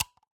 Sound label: wall light double switch off; sonniss gdc